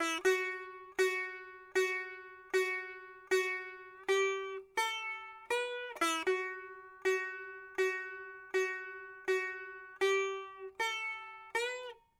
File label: sitar